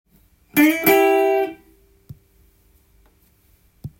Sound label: blues, guitar; blues short phrases tab